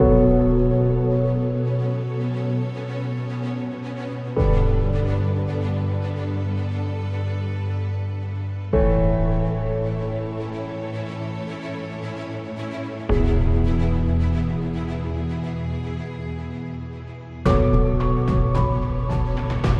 Movie Trailer, epic music; epic music vol 2

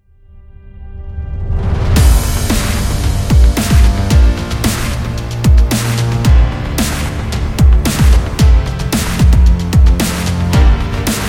Orchestral Dubstep; epic sport metal

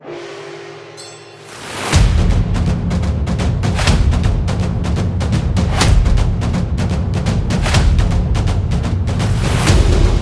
Japan Trailer, tribal dance

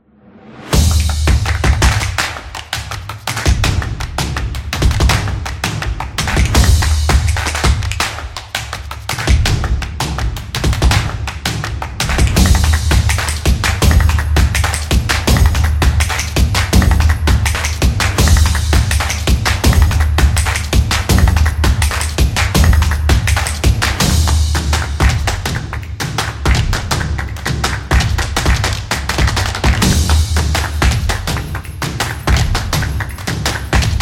A Dynamic; Percussive Nation Vol 10

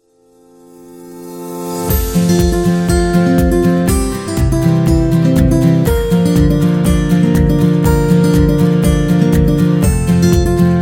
upbeat corporate guitar; corporate music vol 13

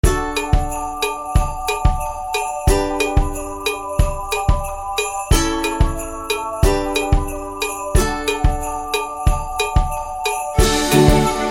Christmas Ukulele

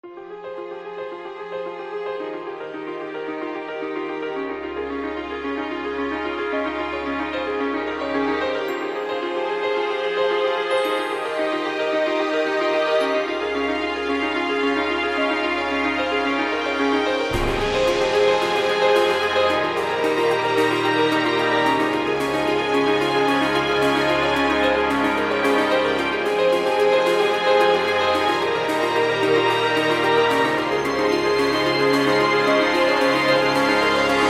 Piano Trailer; epic music vol 1

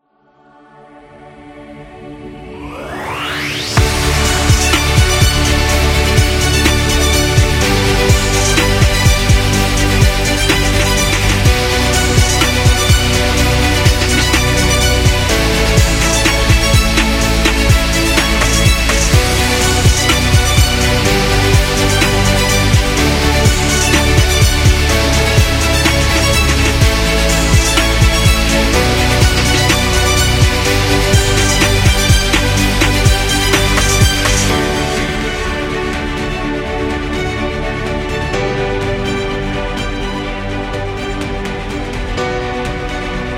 Motivation Upbeat

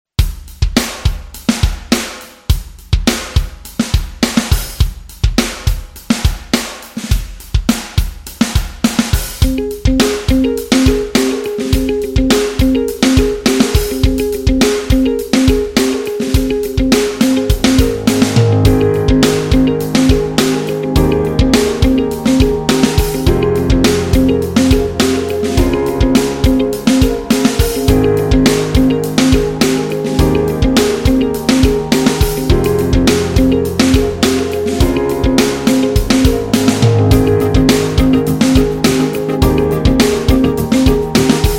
Corporate Rhythm, corporate music; corporate music vol 6

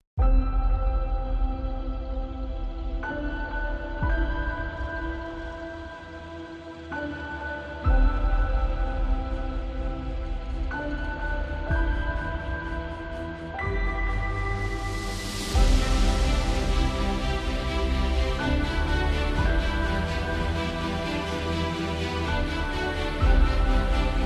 The Epic Motivation

